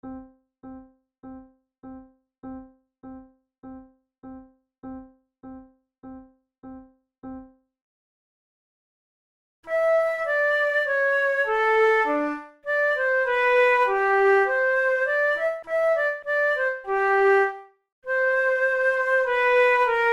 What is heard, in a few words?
methode de flute; tulou